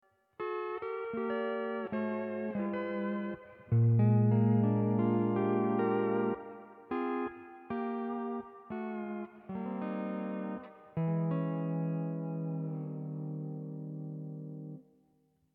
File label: hawaiian piece